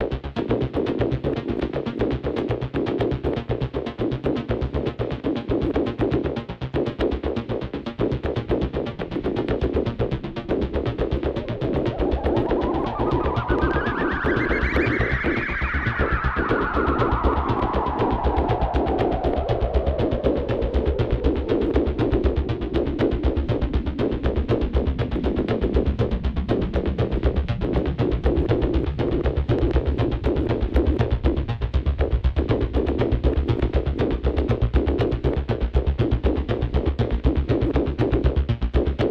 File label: Synth Blade Loop